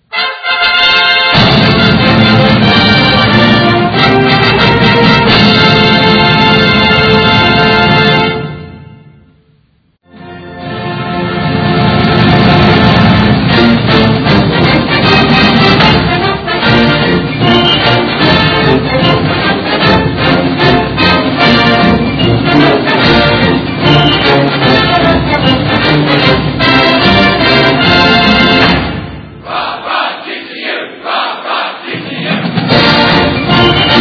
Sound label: Fight Song; TCU Horned Frogs; NCAAF Football